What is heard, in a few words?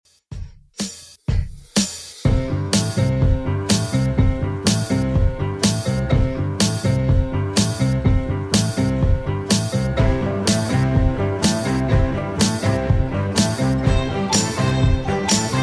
60s Redrum 124 bpm